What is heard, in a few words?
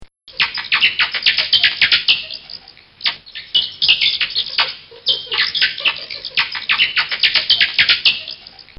Bats chatter; Animals; Bobby Cole